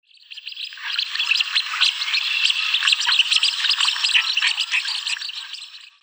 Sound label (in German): Blaufluegelente Anas discors; Vogelstimmen